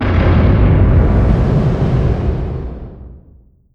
distance super heavy battleship defend; Hearts of Iron IV Death or Dishonor

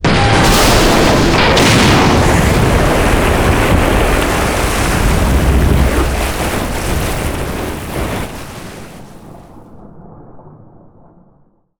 super heavy battleship destroyed; Hearts of Iron IV Death or Dishonor

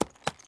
horse walk; Mount&Blade Warband

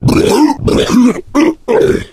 male boomer shoved; voice; Left 4 Dead 2

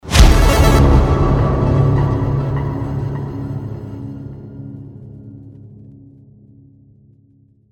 deathcam, music; Counter-Strike Global Offensive

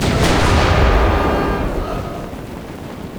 super heavy battleship damaged attack; Hearts of Iron IV Death or Dishonor

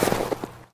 snow, footsteps; Counter-Strike Global Offensive

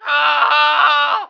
burn dying; Emperor - Battle for Dune

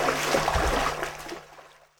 wade; Ace of Spades

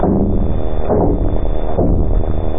transformer loop; Garry's Mod; hl2

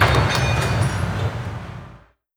click research; Hearts of Iron IV Death or Dishonor

